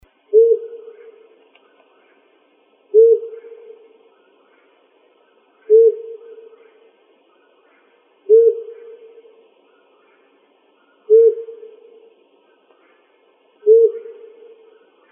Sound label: FLOW, Owls; AZFO; deviche